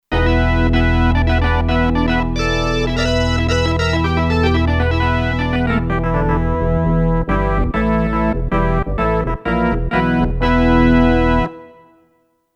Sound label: Drive Organ, Kurzweil K2500X; Factory Programs